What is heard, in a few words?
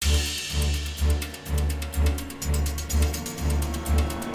Low Music; Alien Breed Trilogy; PS3; Xbox 360